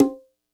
kit, Yamaha Motif-ES8

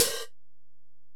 HiHat, Alesis DM-PRO

